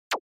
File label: TV Turn Off